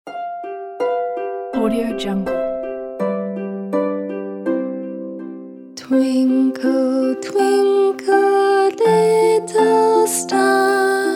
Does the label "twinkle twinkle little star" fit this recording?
Yes